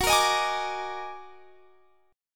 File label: Eb Minor Major 11th